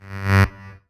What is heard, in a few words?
Synth Shots; Vengeance Samples